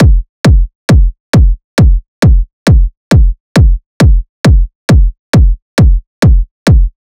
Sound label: Kick Loop 135, Techno Drums